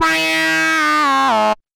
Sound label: Beat